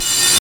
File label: hat tek, Hi-Hats; Roland L-CDX-01; HH Modules